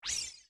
fly in; Navi

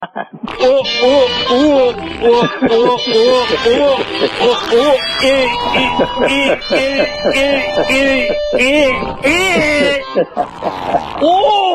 Harmonica

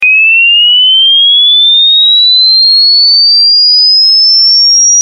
50000 Hz Echo

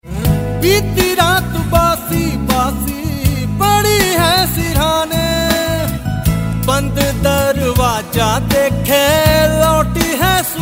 male Version

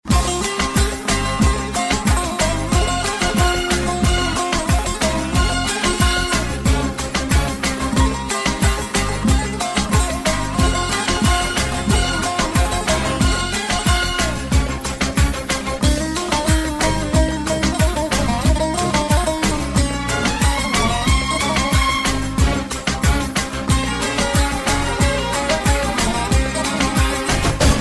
Turkish

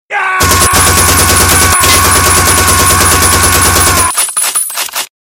Gun Shots

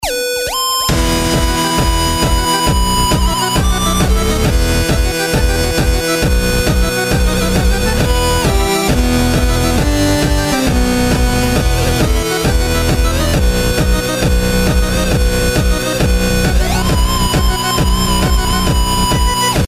8-Bit; Doctor Who

